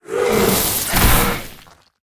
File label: Boss Mech Bull, monster